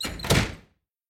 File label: chest closing; robin hood